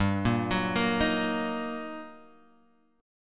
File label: G Minor Major 7th Sharp 5th G, Guitar, Open G; chordshape; down